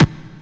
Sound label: hardbassdrumz, breakcore; Bazzdrumz